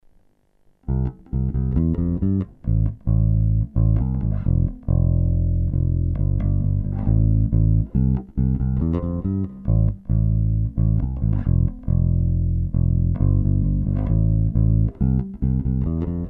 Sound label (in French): basse chevalet; prise directe; basse bleue